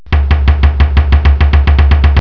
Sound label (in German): nageln